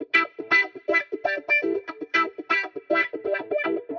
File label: 120 E Drillin, Spaced Out Guitars; Loops; Touch Loops; CosmicFunk